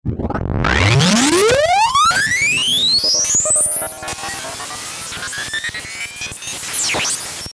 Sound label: Ascend Digital Glitch Static Stutter; Blastwave